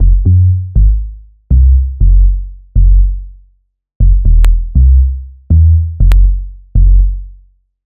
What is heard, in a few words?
Grumm, Baysline; Sonic Foundry (Sony Creative Software) - Future Retro Dance Excursions